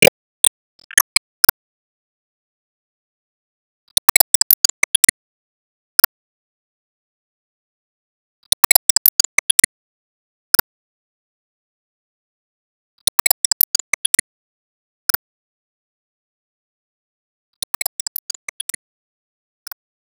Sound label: Acidwear Loop; disney star smash android ios mobile gamerip 2020